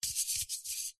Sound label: household aerosol deodorant can handling; zapsplat